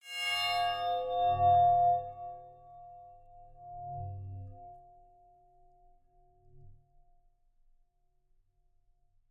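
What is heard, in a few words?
metal impact ring aluminium, interior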